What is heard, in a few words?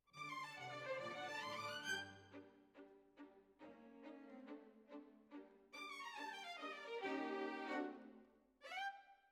Violins, jazz for piano